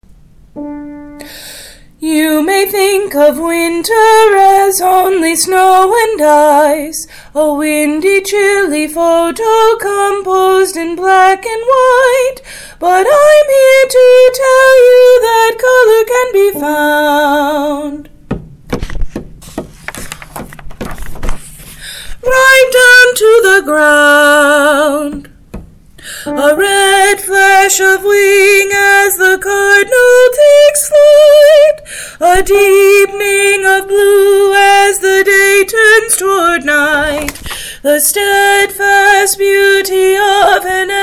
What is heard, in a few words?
soprano